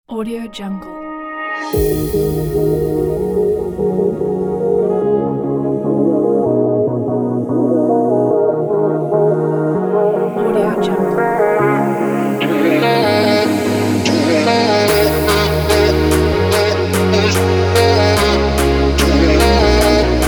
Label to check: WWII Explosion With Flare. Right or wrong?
Wrong